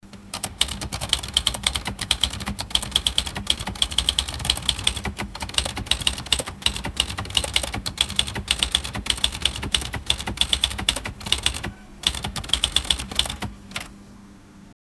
an old typewriter in a

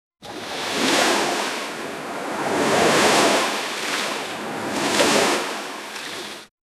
small business jingle wit

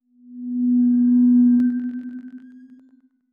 a sfx of an increasing, minimalist

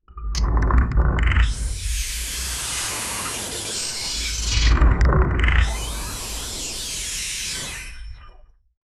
inter dimensional portal

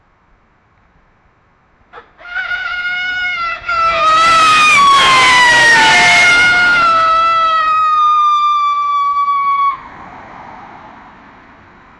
two cars crashing frontal; human reactions

